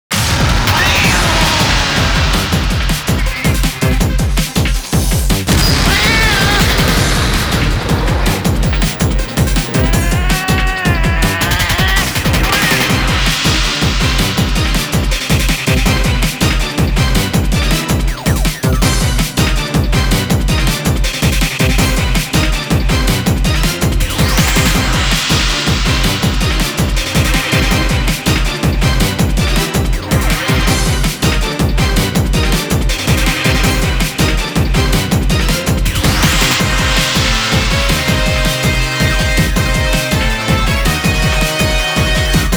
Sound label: Karaoke; Monster Hunter